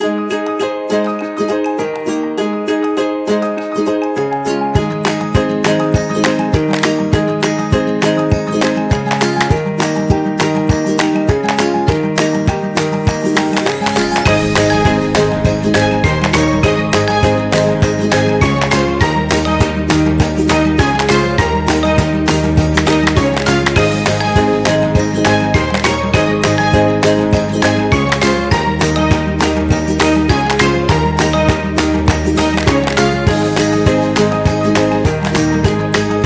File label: Uplifting; happy music vol 8